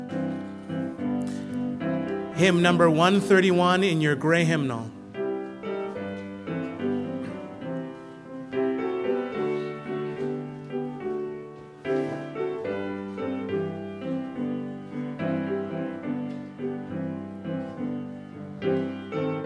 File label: Closing Hymn